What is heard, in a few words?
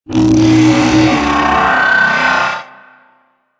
Arachnoid Camper Step Movement; Warframe; Corpus